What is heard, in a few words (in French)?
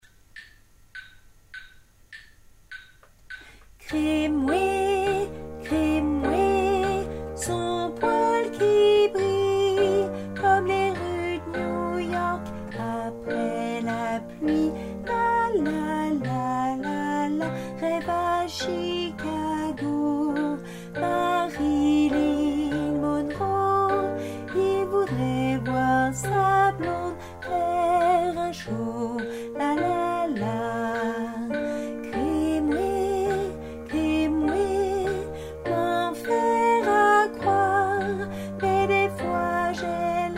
basse